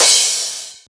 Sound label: Crash